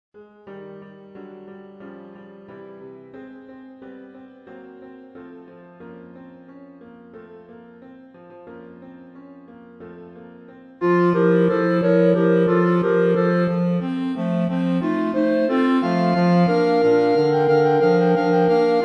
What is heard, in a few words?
4th Chorus Entrance Tutti; My Goodness Me What Shall I Do; grandduke